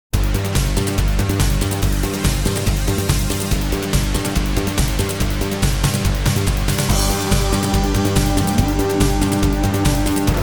Level Boss; panzer dragoon remake ps4 stadia switch windows xbox one gamerip 2020

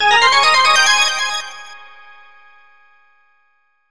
Get Key; Diddy Kong Racing HD; N64

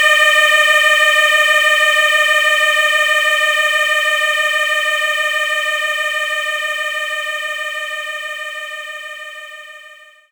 Voice Loop Db3; knights try

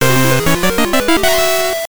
Unused Jingle; Mickey's Speedway USA GBC

